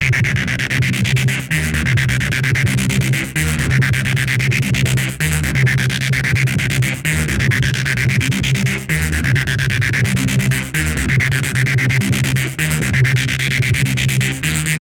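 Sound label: agressive neuro bass reese, bassline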